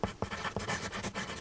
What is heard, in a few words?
Pencil Write On Paper; SFX Kit; Foley - Misc